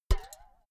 filled can hit bounce ringing dripping

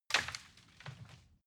wood limb break

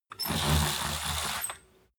roll-down curtain roll up

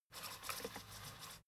fader plastic stutter soft short

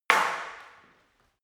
staff wood fighting single hard

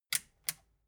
metal button click power on slow

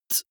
beatbox hi-hat

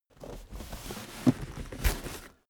cardboard box big put down

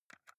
console controller xbox arrow key press fast

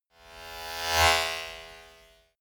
servo small whoosh

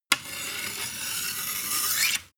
sword scabbard draw slow

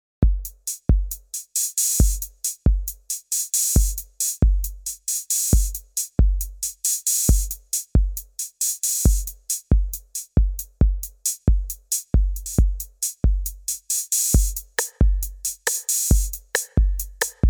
minimal electro groove

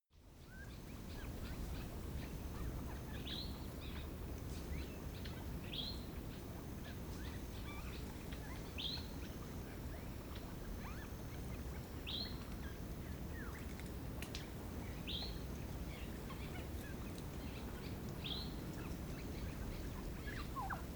african bush midday spring bird

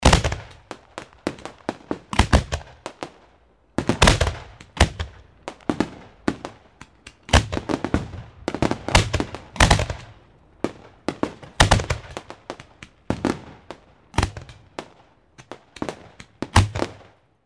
fireworks rapid sequence

